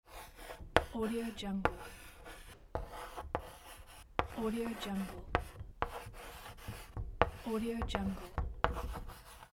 chalk

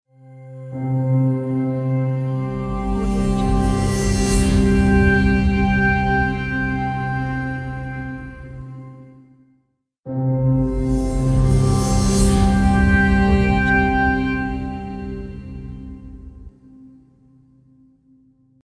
ambient shimmer logo

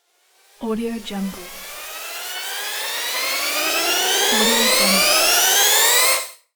riser

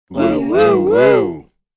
cartoon gloomy voices win fanfare

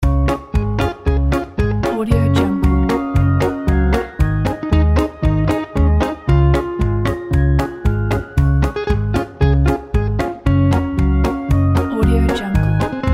music happy children

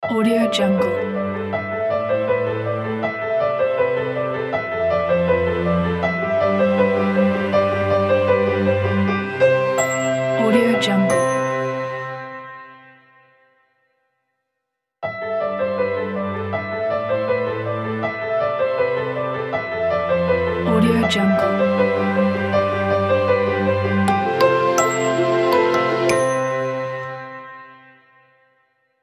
cinematic logo; nmusic

